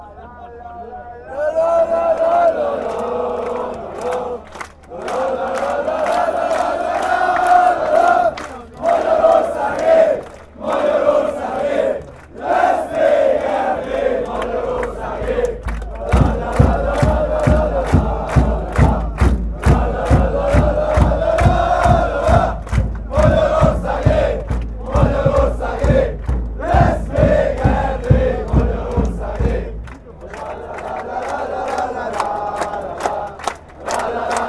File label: chant, fanchants; hungary